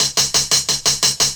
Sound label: Hat 175, Drum&Bass Perc Loops; EZRollers